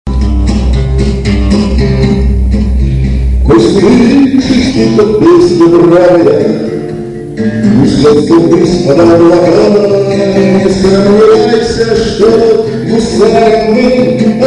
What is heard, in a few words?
march polka